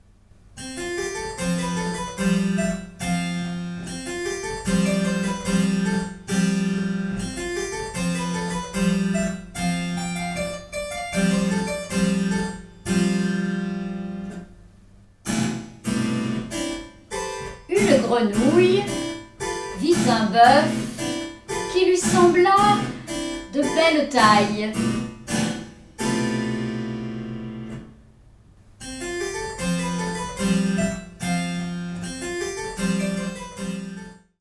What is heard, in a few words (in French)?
clavecin; La grenouille qui veut se faire aussi grosse que le boeuf